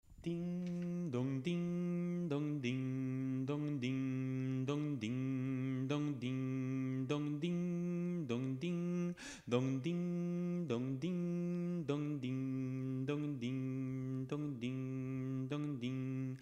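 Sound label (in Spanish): campana bajos; tradicional; Campana sobre campana; (arr. Jesús Alonso); Navidad JOCSMAB 2023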